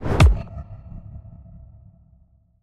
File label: sound design whoosh impact cinematic heavy